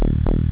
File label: attack